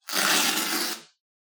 tape pull; soundscrate

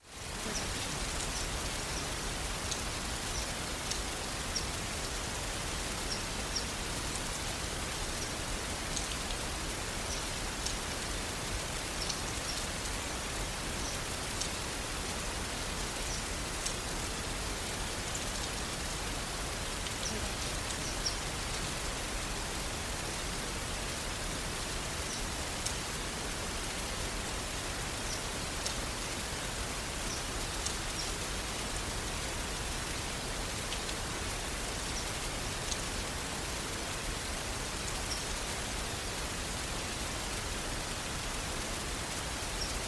rain with bird chirps; soundscrate

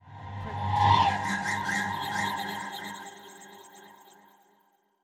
horror creepy sweetener; soundscrate

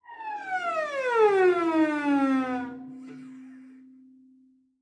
Cello Slide Down; SoundsCrate

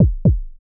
heartbeat double; soundscrate

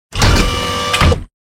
Robotic Movement; SoundsCrate

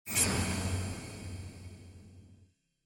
big sword impact

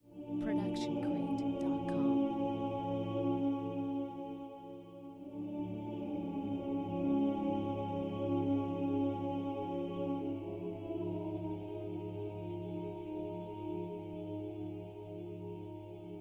monk choir; soundscrate

